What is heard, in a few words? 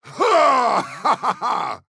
Attack Extreme